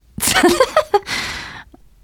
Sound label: laughter, teen girl